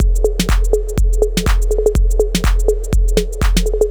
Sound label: 123BPM DRUM, Drum Loops